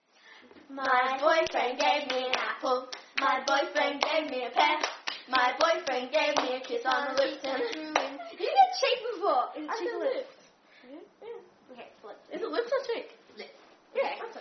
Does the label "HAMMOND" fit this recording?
No